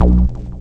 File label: BASS HIT, Synth FX Misc